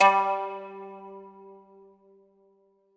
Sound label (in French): mandoline